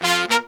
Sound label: Horns, 96BPM; Phantom Horns; 90 s Sample CDs; Zero-G